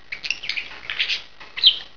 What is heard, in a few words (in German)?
tschirp; Wellensittich